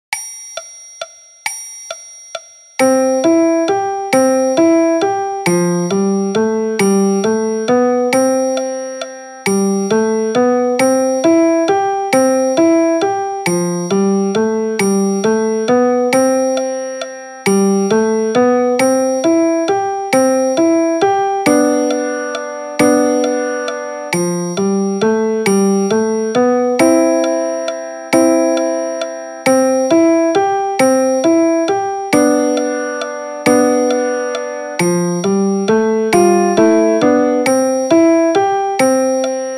Piano Version

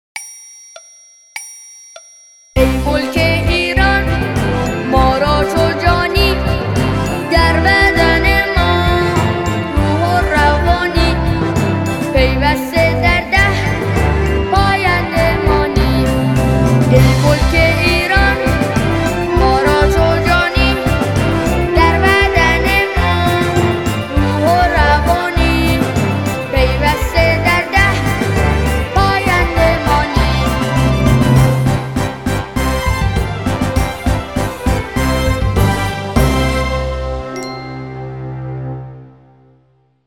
Vocal

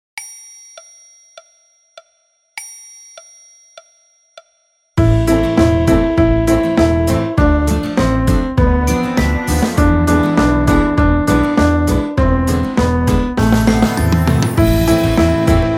Piano Orch

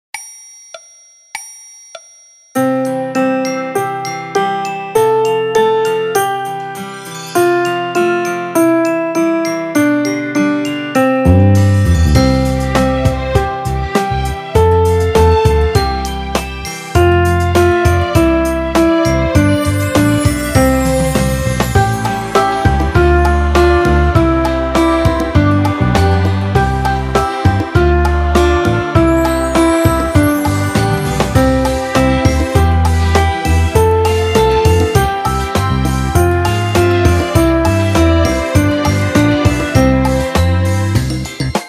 Twinkle Twinkle Little Star in C 2 Piano Orch

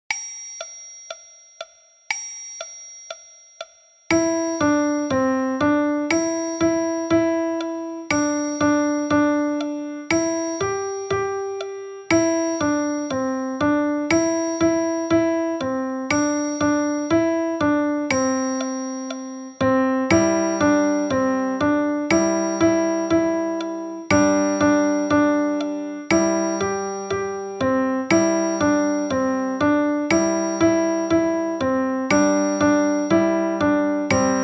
Piano Version; Mary Barrei Dasht Mary Had a Little Lamb; مری بره ای داشت

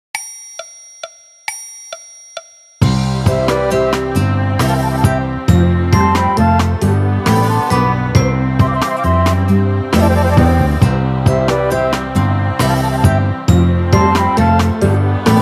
Orchestra Version

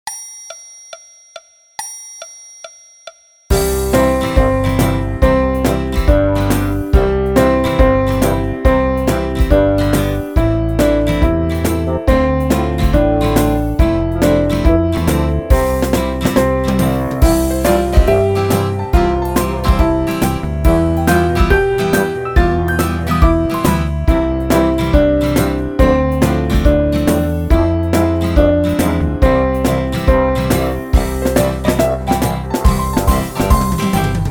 Chek Cheke Baran 2 Piano Orch

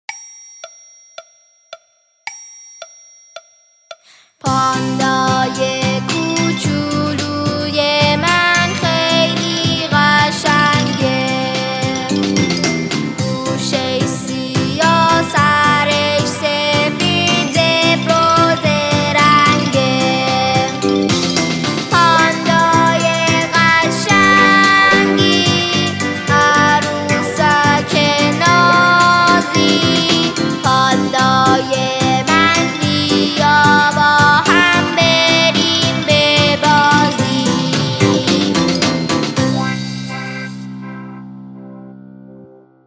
Vocal

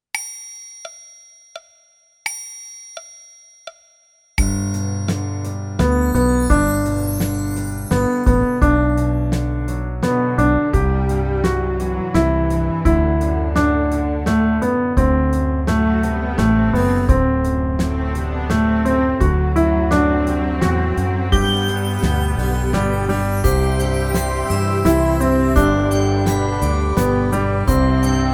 Lalaee Lullaby Piano Orch; لالایی لالابای

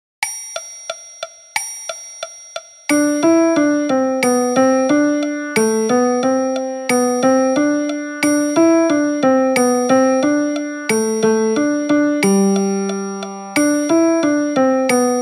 Piano Version

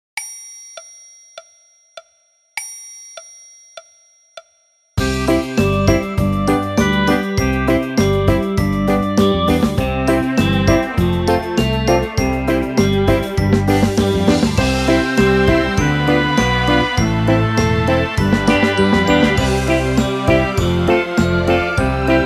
Orchestra Version; Tab Tab Abbasi; تاب تاب عباسی

